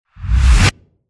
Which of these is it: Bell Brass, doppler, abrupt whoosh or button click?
abrupt whoosh